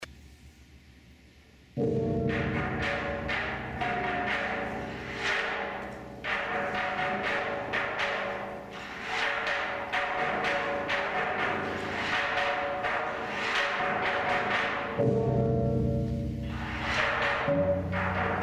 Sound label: frog drum